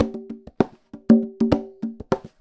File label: Conga Loop (100BPM); musicradar